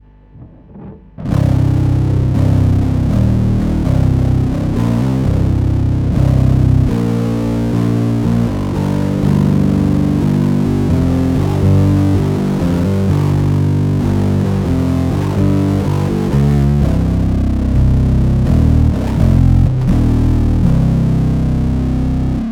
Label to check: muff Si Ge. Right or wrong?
Right